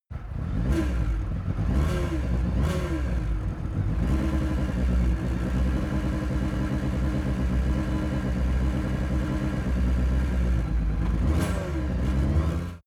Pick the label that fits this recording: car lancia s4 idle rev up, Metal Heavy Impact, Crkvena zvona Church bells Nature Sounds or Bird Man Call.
car lancia s4 idle rev up